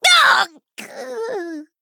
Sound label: Male Murloc Death